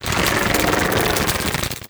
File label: extra electrics submerge